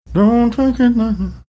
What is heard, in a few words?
singing like manner